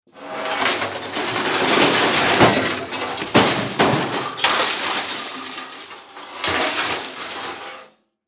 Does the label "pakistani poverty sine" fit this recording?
No